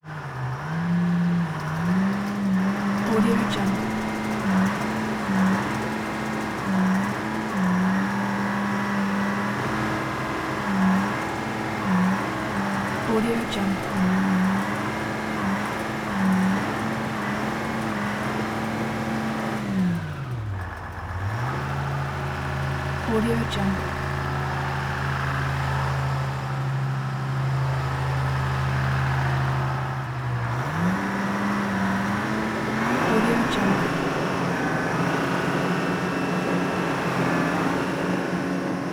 40 ton diesel crane cab rotate back and forth, industrial sounds; چرخش کابین جرثقیل دیز